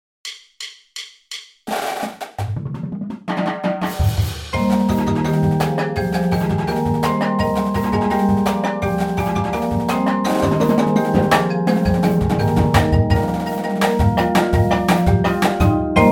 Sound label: PERC